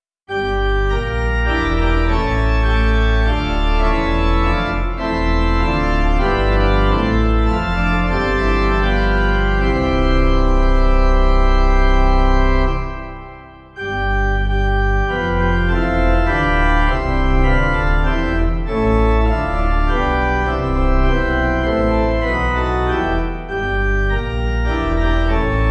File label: organ; parker; Hymnal 1982